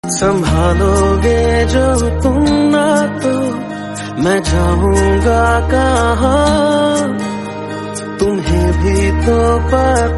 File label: Hindi